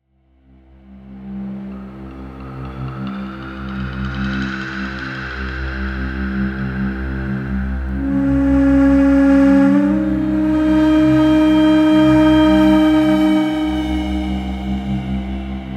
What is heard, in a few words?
Mystical Bells